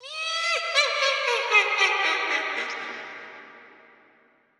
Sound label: witch sound